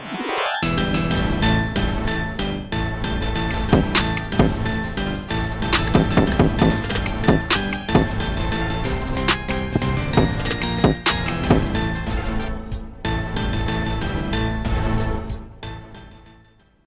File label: Nokia 10 Plus Remix Ringtone